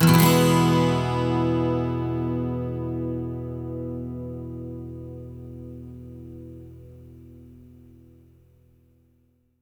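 12 string major E, Electric & Acoustic Guitar Loops; USB Soundscan vol.04; AKAI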